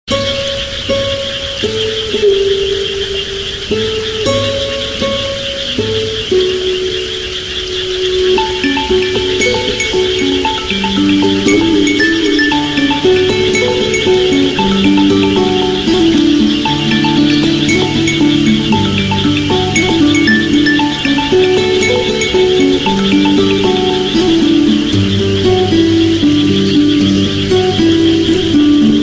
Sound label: Samsung Note 6, ringtone